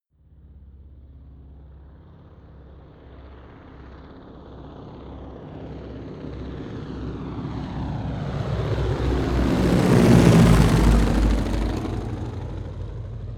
propeller plane ju52 fly by overhead from right

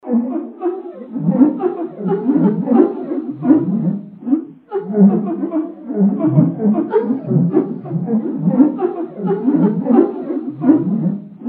creature loop

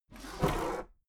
desk drawer open heavy

